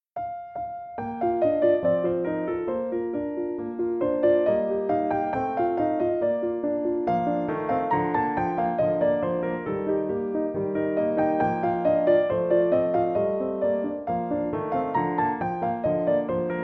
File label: backing tracks